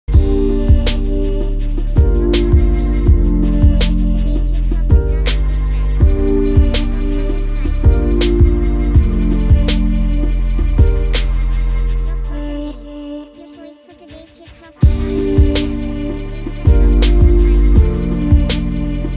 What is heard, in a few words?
BEAT